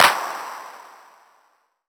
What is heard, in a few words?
CLAP